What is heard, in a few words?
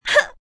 hurt